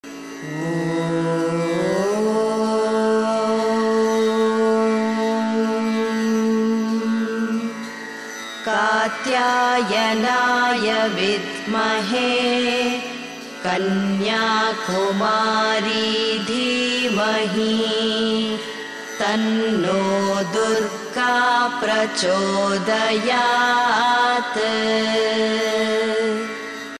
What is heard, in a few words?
kanyakumari (durga) gayatri female